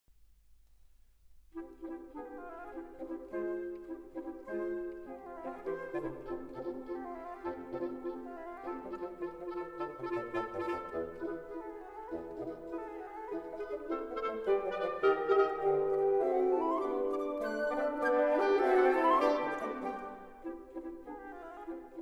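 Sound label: wind quintet; klughardt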